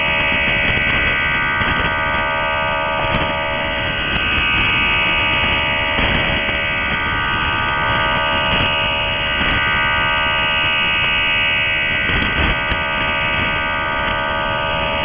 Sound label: Chinese MIL 4+4 8 x 75 Bd QPSK Idling; PRC4+4